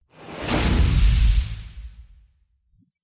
Elemental Magic Spell

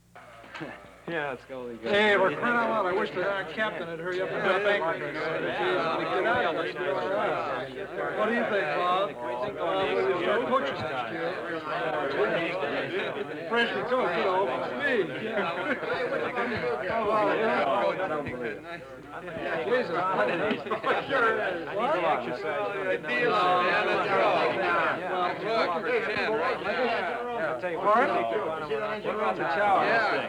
Small Gathering Of Friends

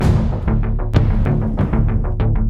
Epic Drumloop

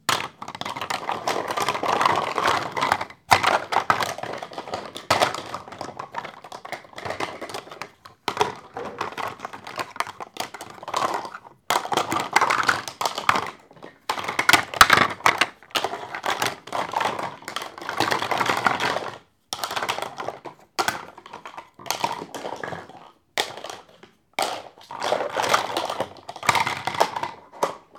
Building Blocks

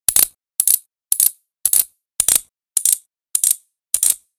Handcuff Clicks

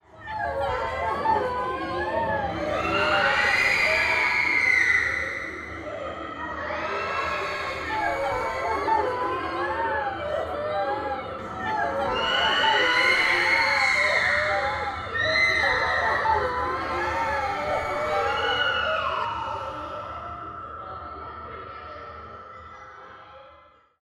Scary Ambient Alien Attack

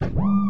Robo

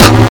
Hardcore Boom

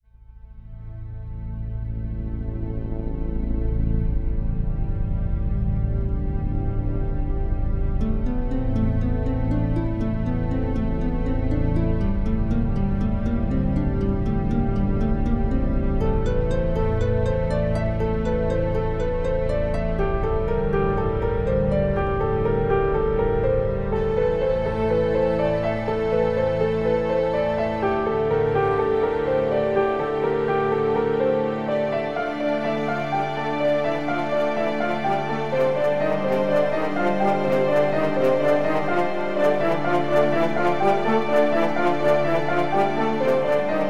Dramatic Arc

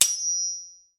Machete

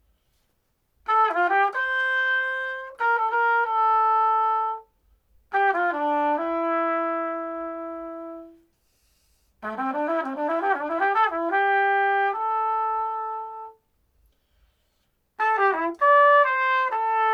Trumpet solo; Hikmet

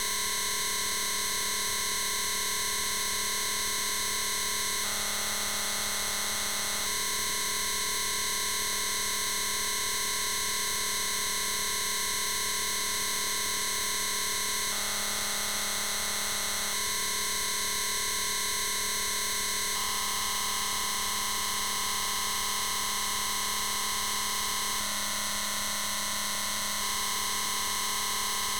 VCR tone

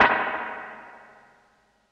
guitar chord

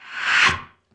Woosh Slide In Longer

